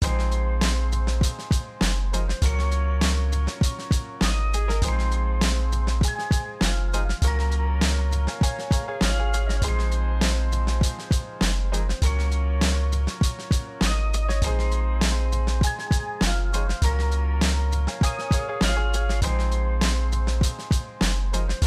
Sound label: bling bass; ebony enforcer